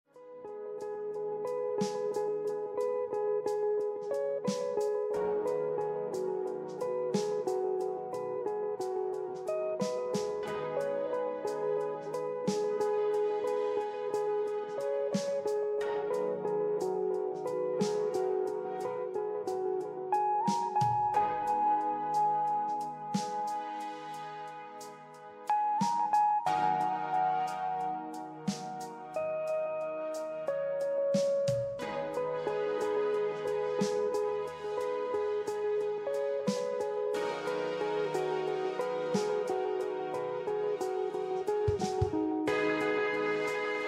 musica; Vecitas

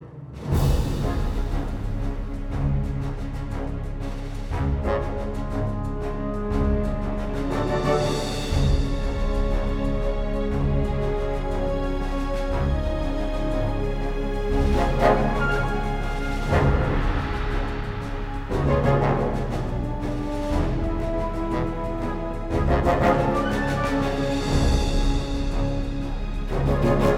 Loading Screen Loop; Aces of the Luftwaffe Squadron

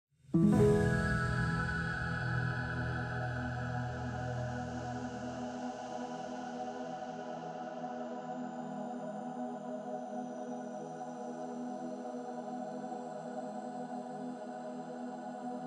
Forest Music, Recording Buttons Stinger D

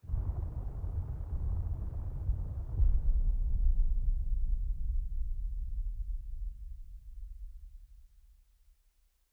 Desert Mech Gates; Desert SFX

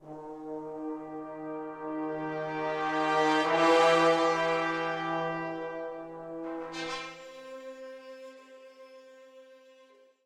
Castle Stinger Puzzled Solved; assassin s creed chronicles india; E02 Herat

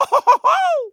LAUGH; The Wild Thornberrys Movie; BOKO

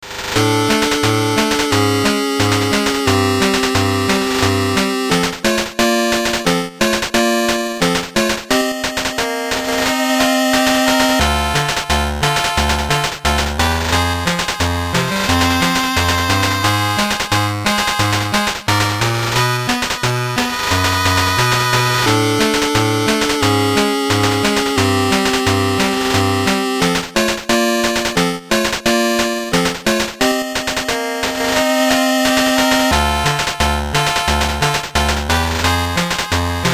BGM, WonderSwan Color; kidou senshi gundam ghiren no yabou tokubetsu hen aokisei no hasha

